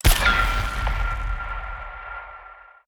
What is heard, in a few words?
fireboom